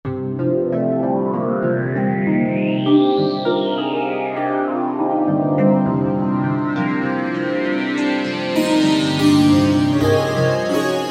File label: Harpin Pad, V-Synth